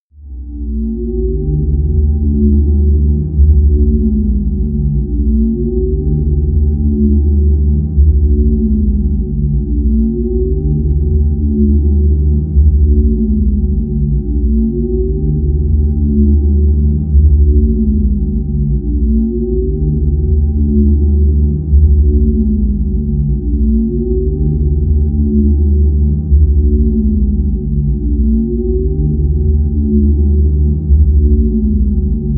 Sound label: drone nation